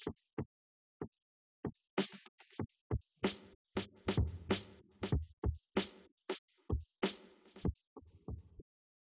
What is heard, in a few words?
wdrums inst sim; SiSEC08; SiSEC underdetermined